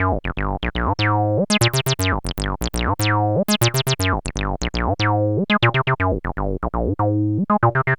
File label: TB-303 LOOPS